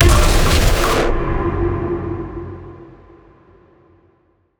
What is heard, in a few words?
Tension Builder Impact